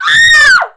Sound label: Halloween kyah